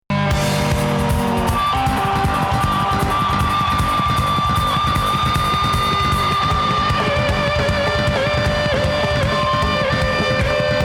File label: Instrumental Jam; greenfest